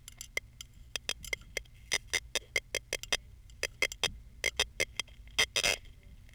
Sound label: Palinustus waguensis rasp